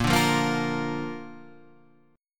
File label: A sharp Major, Guitar, Standard; strummed; JGuitar